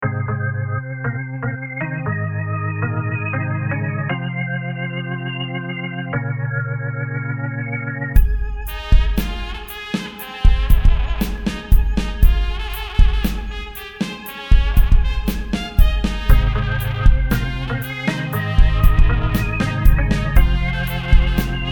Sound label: Organ Trumpet Jam